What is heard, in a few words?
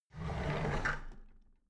drawer big clatter open regular